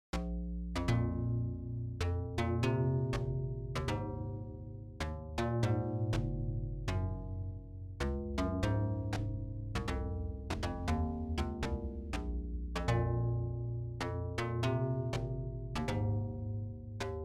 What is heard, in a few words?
bass